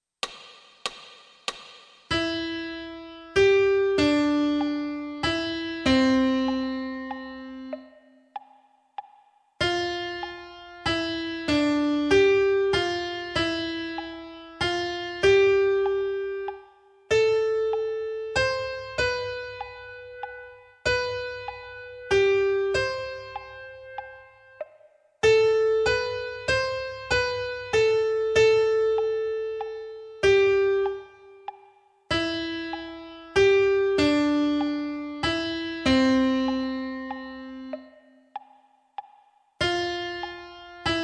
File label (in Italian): Piano Ascolto